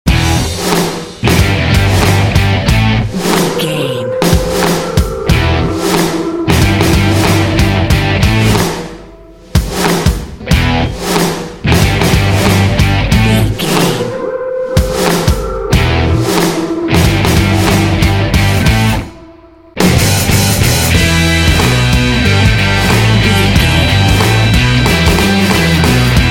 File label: powerful blues rock gritt vocal